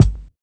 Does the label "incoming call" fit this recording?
No